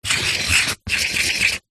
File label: office pen highlighter draw shape on paper on cardboard